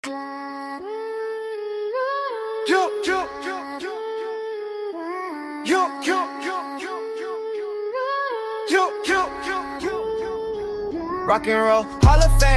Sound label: TRAP Fast